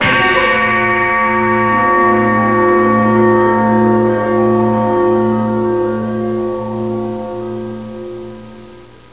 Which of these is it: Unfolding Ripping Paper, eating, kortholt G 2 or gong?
gong